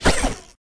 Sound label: grappling hook yank; Golden Crayon Sounds